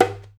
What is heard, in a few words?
DUMBEK, ETHNIC DRUMS; Spectrasonics; Liquid Grooves 1 & 2